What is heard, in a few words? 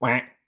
duck